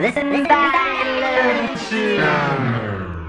Vox FX; Sonic Foundry (Sony Creative Software); Electrocution

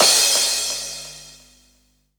CRASH, Dance Mega Drums; Best Service

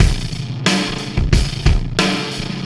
System Overload Beat, Processed Drumkits; Sonic Foundry (Sony Creative Software); Processed Drumkits Zero Gravity Beats

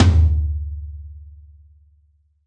Real Tom, Roland BK-5

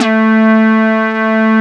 PL MOOG; Wizoo - Powered Nord; STEREO LAYER

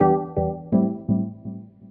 E PIANO 125, Keys; Houseworx

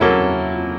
Piano Chord

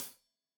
Hat Tight, Hi-Hat, Cymbals; Deeply Sampled Drums

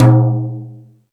Jazz Drums, E-MU Pro-Cussion; drum machine

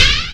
ZAP, Hip Hop Misc Perc; E-MU Producer Series Vol. 8 – Platinum Phatt

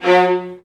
violins G#3; ILIO

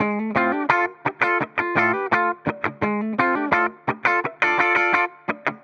Tele, Guitar, 85bpm, D, dusty funk; musicradar

